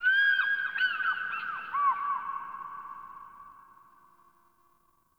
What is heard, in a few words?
Birds High; Ambience; Warframe; Eidolon Plains; Eidolon Landscape